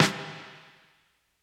80s reverb snare; Acoustic

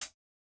hihat, japanese pop

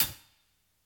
drumbreak hihat dry; Hiphop

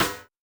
snare; Electro; alternative

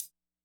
disco hihat

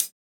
hihat, japanese pop

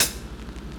drumbreak hihat

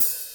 open; Electro; alternative

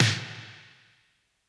80s reverb snare

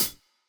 japanese pop hihat

